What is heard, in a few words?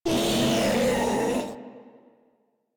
Vocal Attack; Warframe; Proto Infested Ancient